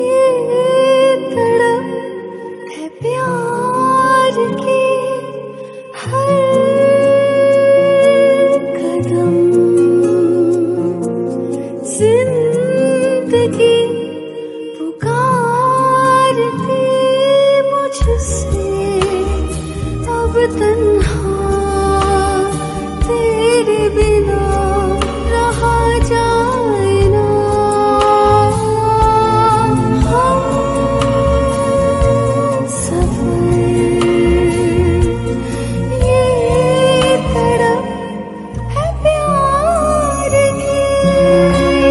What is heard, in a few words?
Sad Song Ringtone